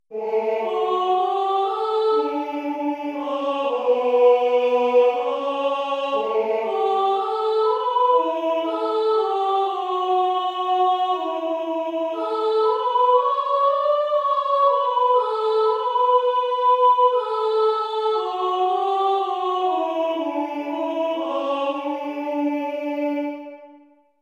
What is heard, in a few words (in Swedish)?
i vinden sopran